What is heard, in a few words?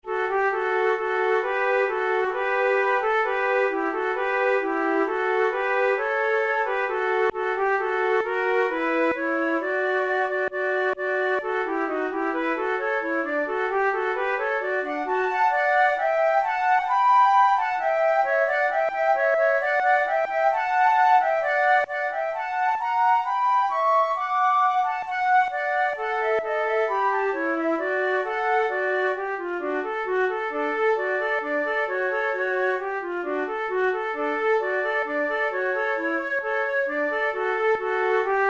duet, flute